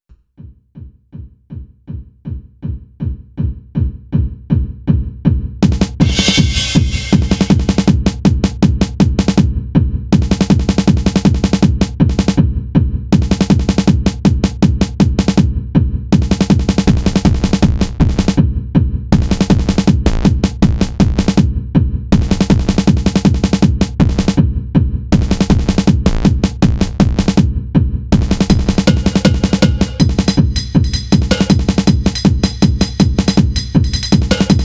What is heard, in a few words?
Danger Drums